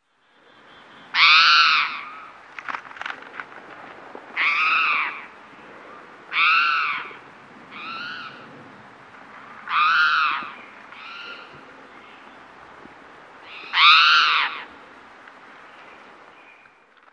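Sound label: animal bird distant caw; modern warfare 2; amb emitters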